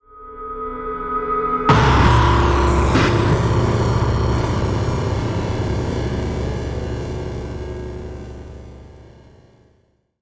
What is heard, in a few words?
SE attack